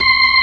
B3, Drawbars

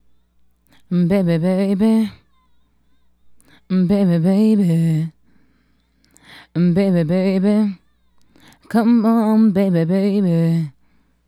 Female Solo, Hip-Hop and RnB Vocals

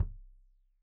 Kik Stereo; Deeply Sampled Drums; SMDrum

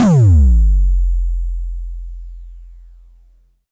Kicks, Elektron MachineDrum SPS1 MKII

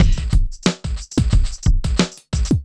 Club Tip, R&B Drums

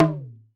Talk Down, Alesis D4 Drum Machines

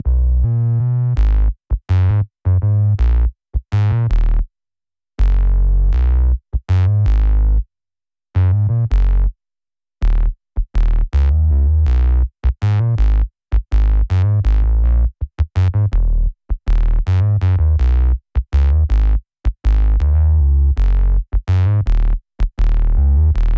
bass, Smooth RnB, Ebmin; Big Fish Audio; Legendary; Happiness

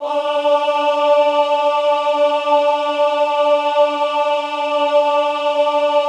AHH D3, Classical Choir; Best Service; Extended Classical Choir; AHH MIX

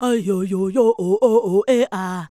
Female Vocal 100bpm G Dry, African Voices; Quazibeat; African Secret

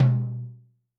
Jazz Lo, Tom, Yamaha DTXpress-II

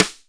STICK, Drum 1 Dry; East Collexion

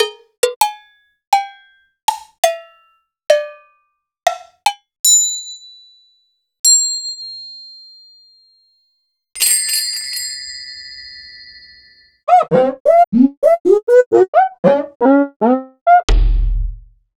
Roland BK-5 Percussion

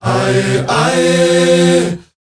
AH YEH, S. AFRICAN, World Voices; ILIO; Vocal Planet VOL-5

